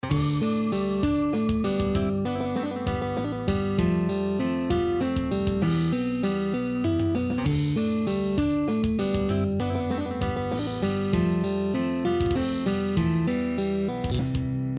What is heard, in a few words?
Post Chorus Plucking, guitar